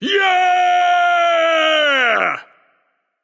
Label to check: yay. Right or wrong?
Right